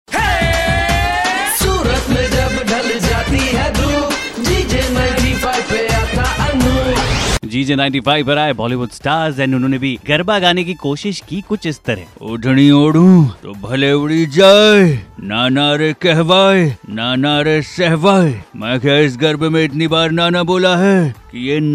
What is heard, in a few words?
bollywood garba